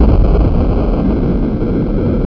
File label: flame